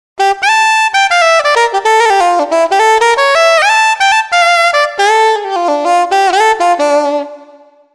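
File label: Soprano; TURBO VL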